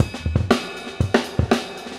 Drum loops, Funky

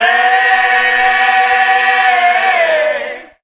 voice "ay"; Phonky

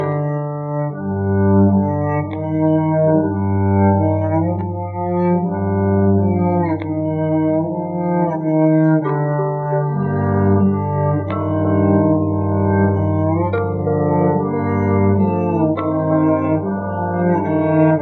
strings, Sad

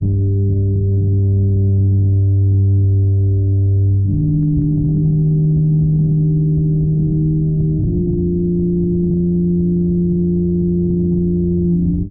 bass strong strings, Loops, Sad

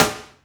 boxy, snares real

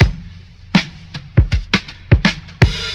almost boom bappy, Drum loops, Funky

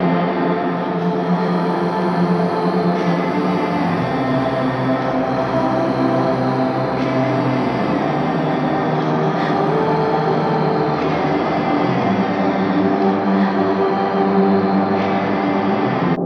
keys voice piano, sad loops

